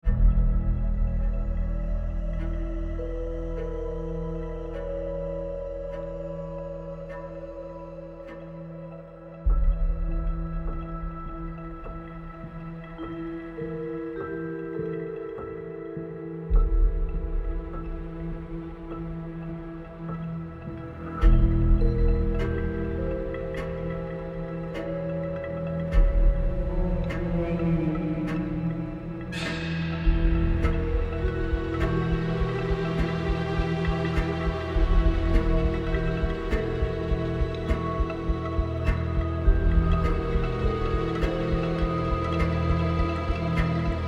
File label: Teeth suspense, soundtrack